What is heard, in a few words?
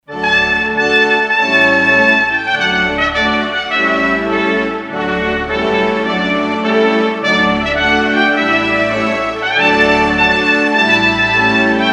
Trumpet Tune; wedding